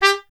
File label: TP G; Miroslav Vitous; Solo Instruments VOL-1